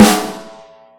normal hit clap; osu

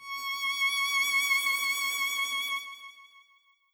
violin

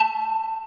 Arp; osu

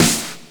snare divided

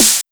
Snare Med Wet

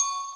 Bells

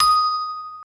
Bell D#6

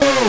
synth; osu

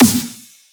snare

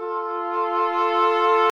flute A5 F5

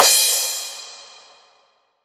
slap crash

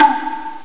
japan perc

